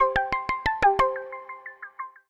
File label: alert